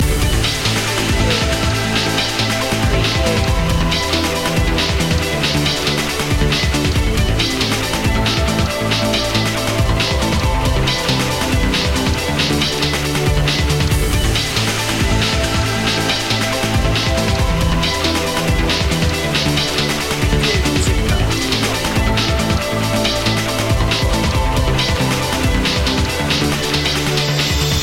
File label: trance dnb loop